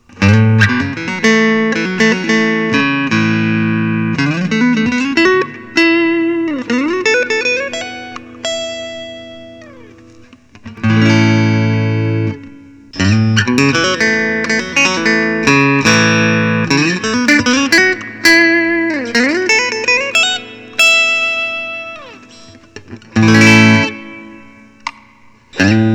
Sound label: fender telecaster blonde